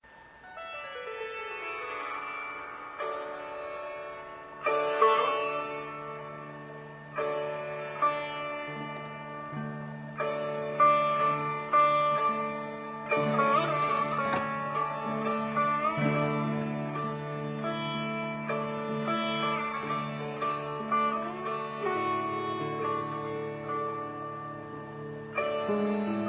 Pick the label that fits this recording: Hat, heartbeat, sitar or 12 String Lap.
sitar